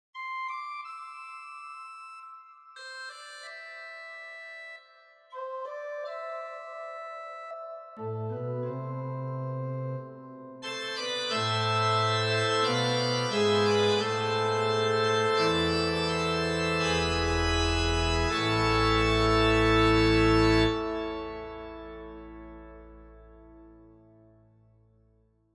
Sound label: Organ song; Working principle